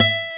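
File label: GUI NOTE, acoustic guitar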